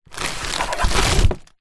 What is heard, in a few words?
plastic packaging remove from cardboard box; foley; zapsplat